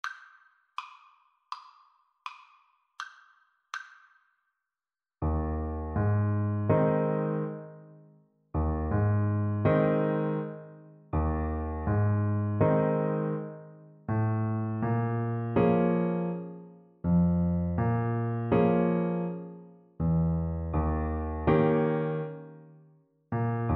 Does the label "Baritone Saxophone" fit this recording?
Yes